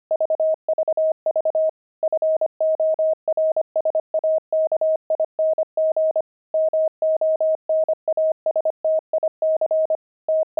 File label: pangram, intermediate pangrams files